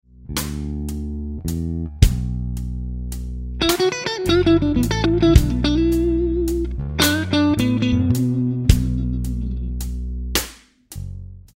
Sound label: Lick, guitar; Slow Minor Lick 1; Minor Blues licks